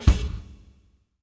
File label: OOPS, PROC SNRS; Sampleheads; New York City Drumworks VOL-1